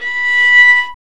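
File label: strings viola, chamber harm; Viola Solo; Vla Harmonx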